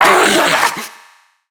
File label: Treasure Box Pain; Warframe